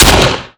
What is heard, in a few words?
dp-28 fire